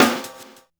snare, Hardcore Breakbeat, 134 Emin; Big Fish Audio; Hardcore Breakbeat Action; Mellow Jack

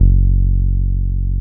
TB-303

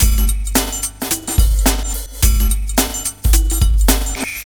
RAP TRAP 108

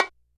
RX-120 Bongo High, Yamaha RX-120

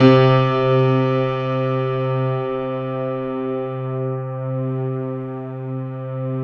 piano, Piano+String

